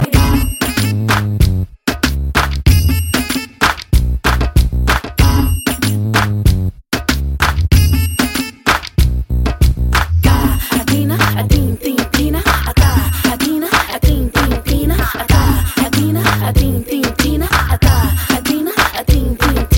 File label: Rocking Tune